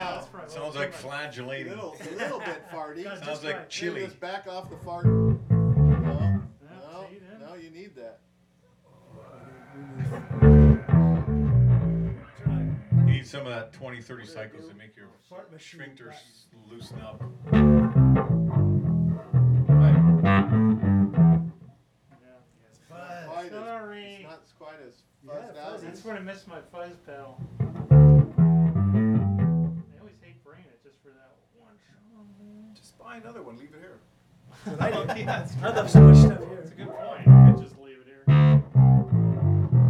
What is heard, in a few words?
bass conversation; Talking about Andy's Bass